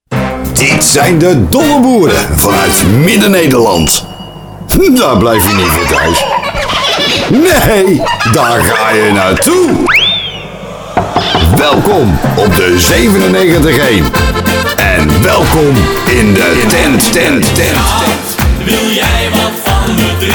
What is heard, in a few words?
Jingle; Audio Fragmenten